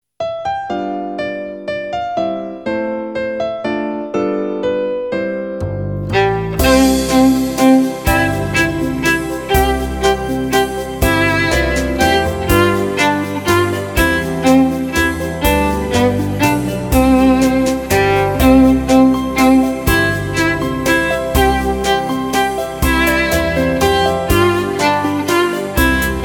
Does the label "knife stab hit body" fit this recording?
No